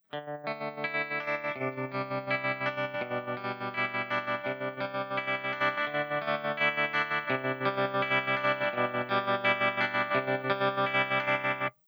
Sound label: tremolo